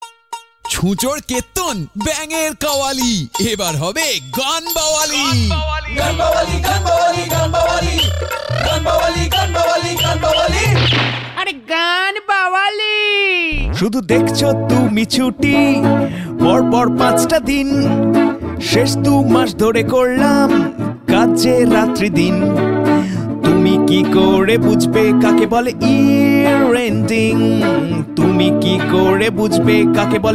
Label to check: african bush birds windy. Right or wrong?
Wrong